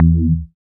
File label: BAS TX-8020T